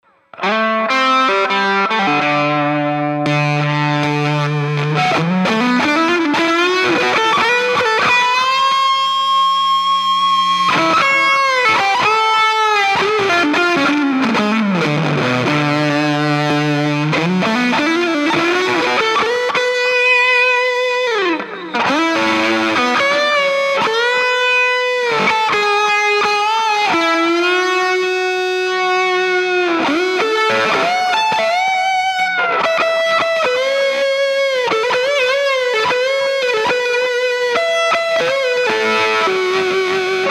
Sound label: Helliphant with Halo Boost; Helliphant Sound Clip 3 - Hellephant with some boost from a Stump Under Foot Halo.